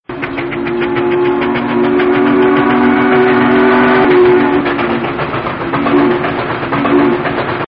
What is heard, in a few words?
Full Speed Train